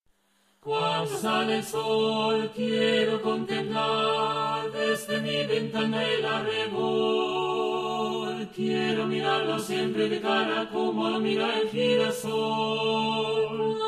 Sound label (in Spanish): el caserio preludio 4 voces